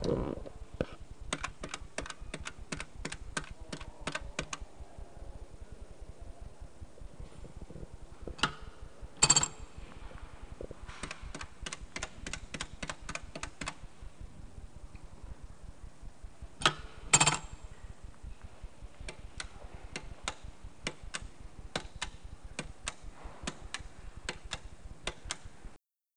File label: phone tap; soundscape